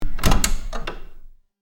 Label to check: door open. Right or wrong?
Right